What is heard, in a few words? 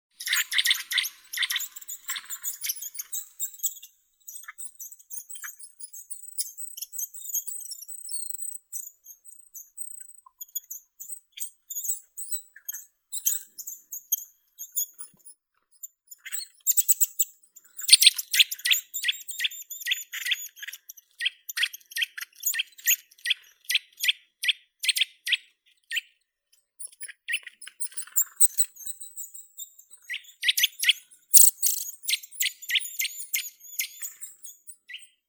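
Eurasian nuthatch, Sitta europaea; popolzen obiknovennii; zvuki prirodi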